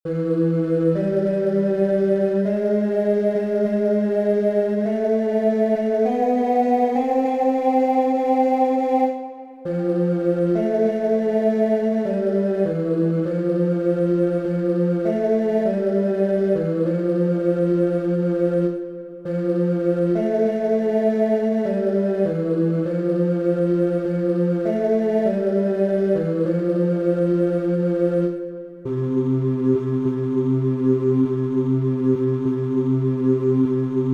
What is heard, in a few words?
BASS